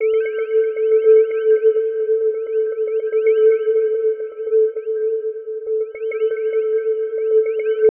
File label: Atmos FX, Atmosphere & FX